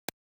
switch off, A realistic flip switch mimicking a physical light switch.